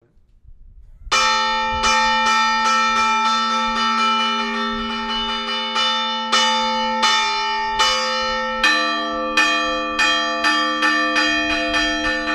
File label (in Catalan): repic general, toc, campanar, es tocava amb les 4 campanes